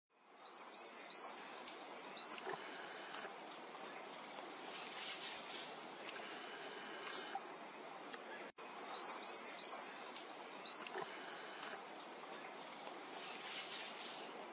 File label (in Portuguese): canto da choquinha chumbo